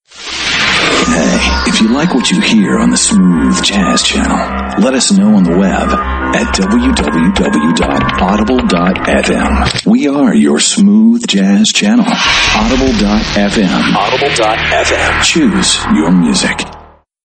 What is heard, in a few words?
Smooth Jazz; Audible FM; ADULT CONTEMPORARY; RADIO IMAGING